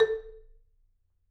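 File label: A3, Marimba dead note; Sampleheads; Dave Samuels Marimba & Vibes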